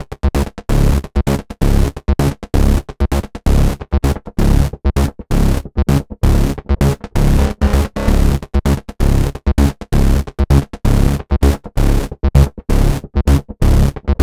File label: Bass, EDM; Sonic Foundry (Sony Creative Software); Afterhours EDM; Ibiza Underground